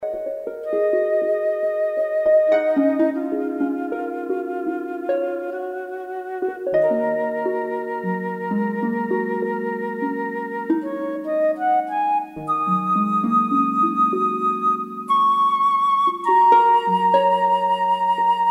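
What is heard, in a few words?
Bell Harmony Short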